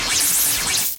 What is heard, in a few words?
electric floor; Traps & Hazards; freepixel